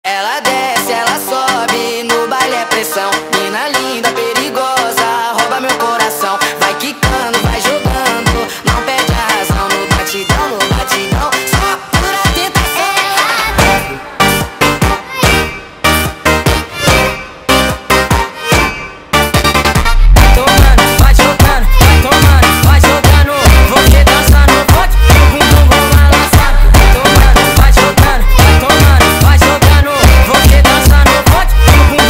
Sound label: funk sped up